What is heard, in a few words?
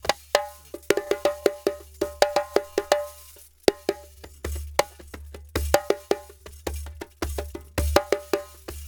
Djembe with Kessing, African